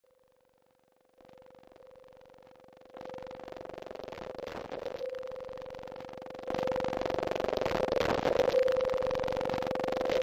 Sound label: CINEMATIC PHONE CRUNCH